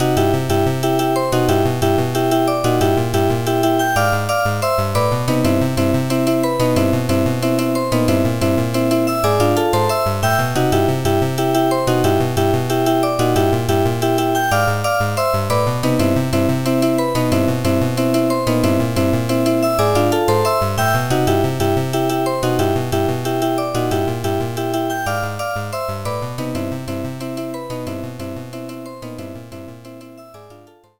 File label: BGM DIG2 BGM; Dig Dug Digging Strike